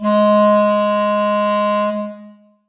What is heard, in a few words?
clarinet g#3; Sonatina Symphonic Orchestra